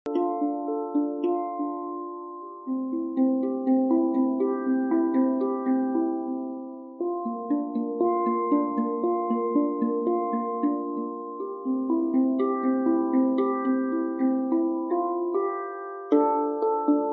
handpan 10 notes d minor; emerald shell

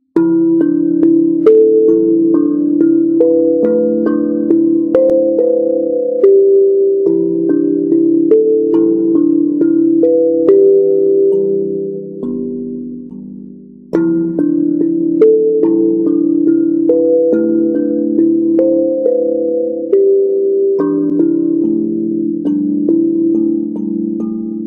steel tongue drum 14 notes; cosmopan

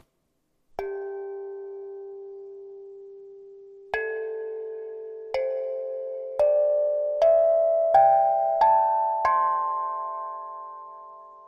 steel tongue drum 8 notes d major 175 cm; metallic grey

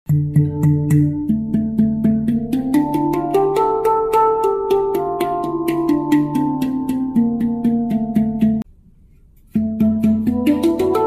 handpan 17 notes d minor kurd; golden

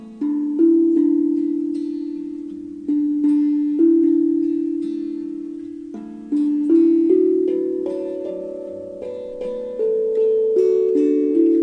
steel tongue drum 15 notes 32 cm

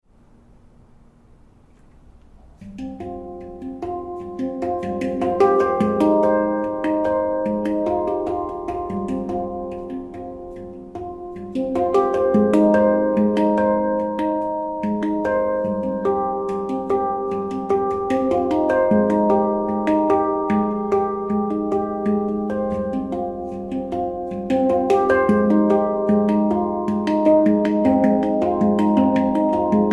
platinum handpan 9 notes f minor

